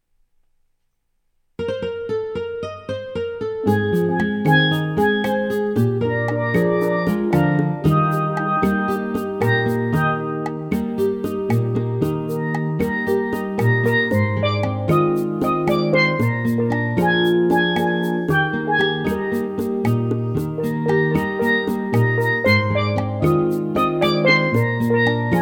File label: steel drum